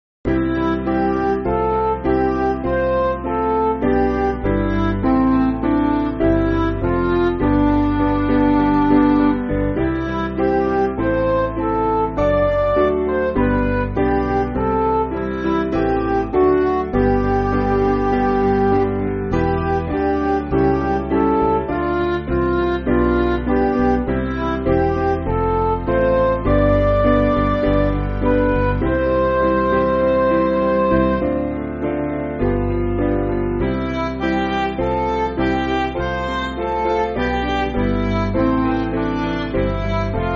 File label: Midi, Music Backing Files for Churches; Angel Voices; Pott